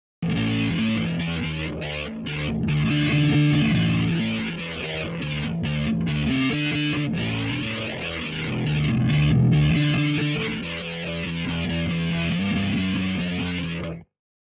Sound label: bass guitar fuzz flange